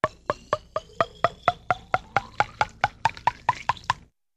Funny Drinking, water

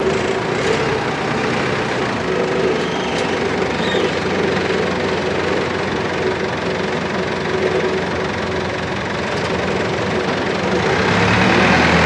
Forklift Steady And Moves

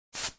Air Hose Release

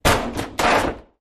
Axe Hits Door Heavy Wo

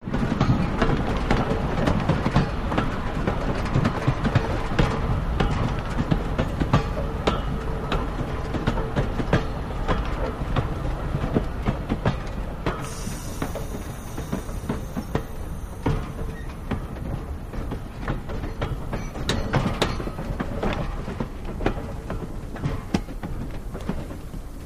Steam Train In Stop

